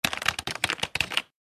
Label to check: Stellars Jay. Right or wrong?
Wrong